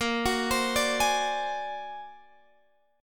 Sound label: A sharp Augmented 9th A sharp Guitar Open G